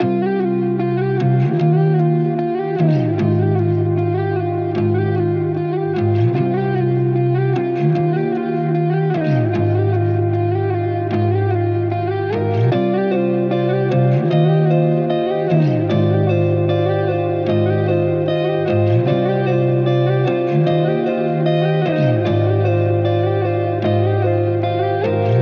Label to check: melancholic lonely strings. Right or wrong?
Right